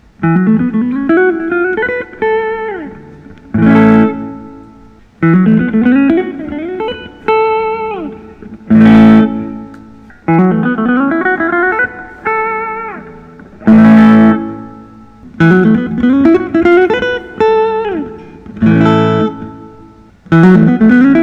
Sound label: parker fly artist butterscotch; 1999